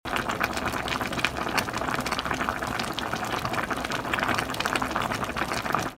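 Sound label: gutugutu, simmering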